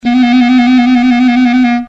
Clarinet Solo; rocktopus

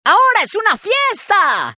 rock; rocktopus

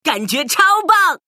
rocktopus sample jazz

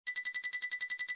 Detection

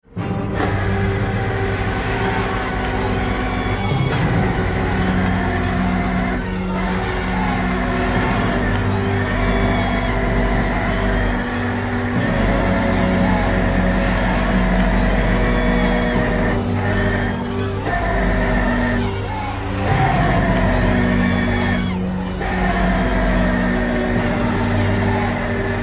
Dramatic Rescue with Winchy Noises and Screams

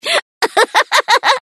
giggle; chameleon

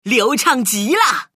rocktopus sample jazz

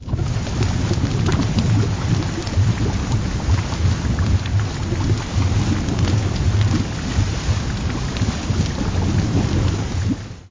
Burbling Lava Short

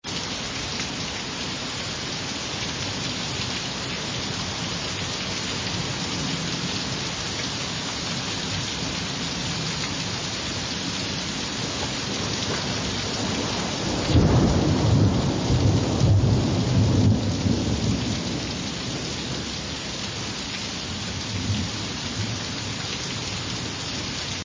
Rain Storm

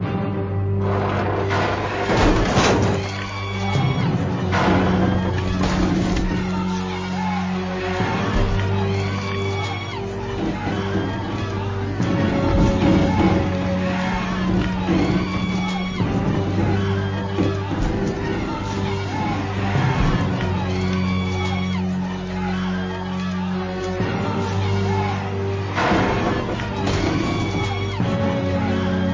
Dramatic Rescue with Ripping Metal and Screams

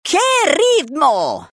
jazz; rocktopus

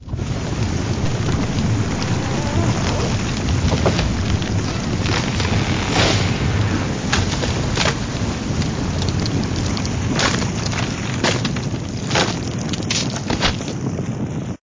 Raging Lava with Falling Trees

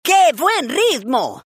jazz; rocktopus

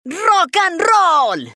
rock; rocktopus

